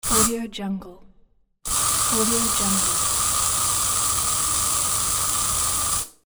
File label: aerosol spray